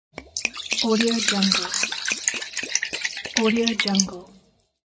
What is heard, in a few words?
pouring wine